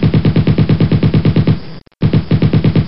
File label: AK-47